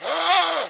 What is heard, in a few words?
Cam Throw